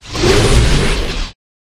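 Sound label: Dragon Skeleton buff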